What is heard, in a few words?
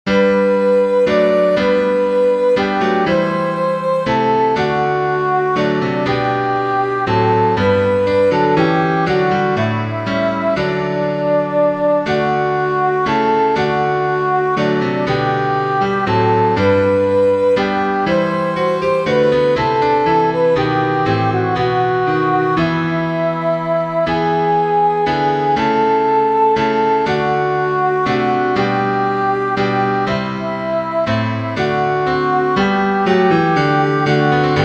westminster abbey soprano; Christ Is Made the Sure Foundation